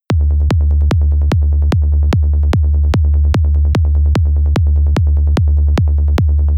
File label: Energyball demonstration kickbass